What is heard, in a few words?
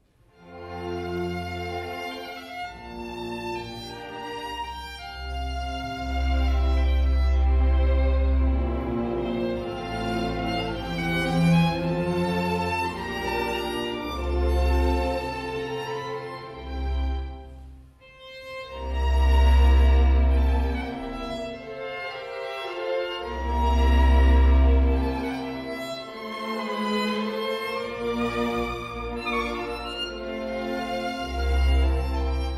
beethoven violin Romanze